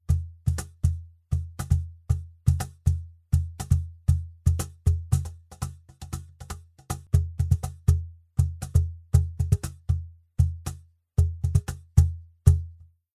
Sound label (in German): Cajon Groove Deckenplatte Cajon unbearbeitet